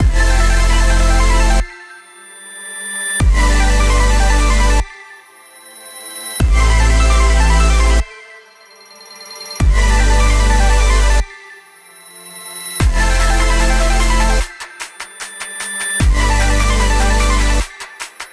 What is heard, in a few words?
Dubstep Drops, ringtones; Google Pixel 4; Play It Loud